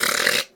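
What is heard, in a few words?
attack windup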